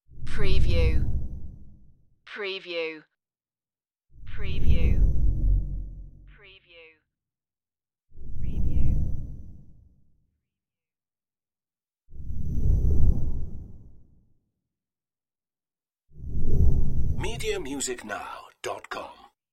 deep flyby whooshes; the sound pack tree

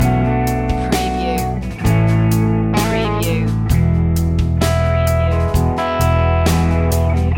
loop, Rock Ballad / Light Rock

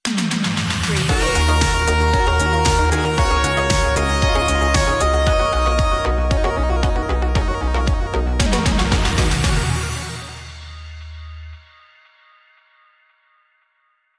retrowave stinger; electronica; melodic

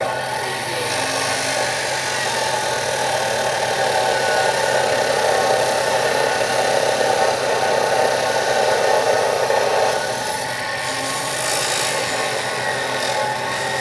grinding machine; the sound pack tree

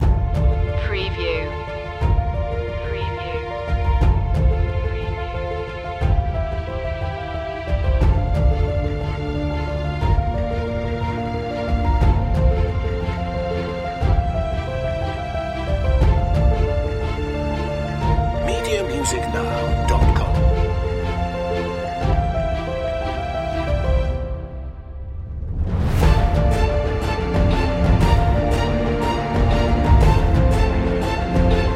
epic emotional cinematic main loop, trailers; Track Length: 1.14

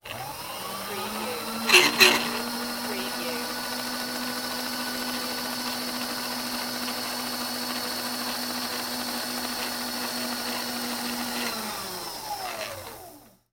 Belt Sander, Industrial Machinery

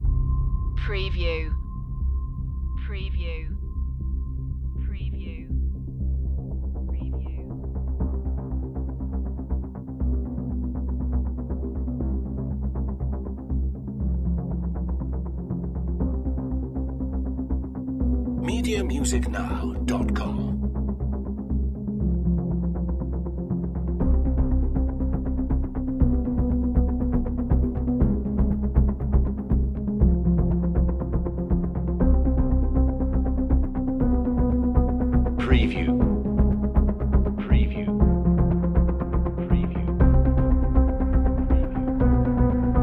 no escape loop, soundtrack spy mystery

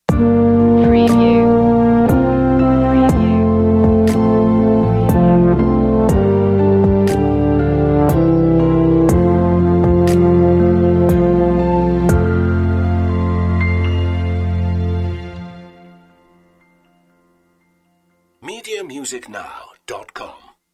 away in a manger euphonium feature

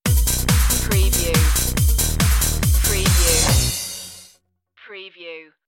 swirling beats stinger; enjoyment

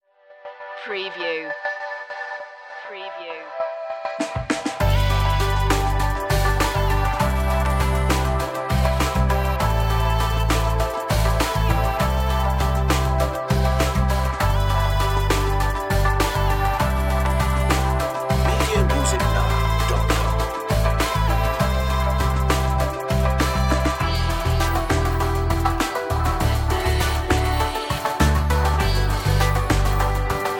funky dream; enchanting

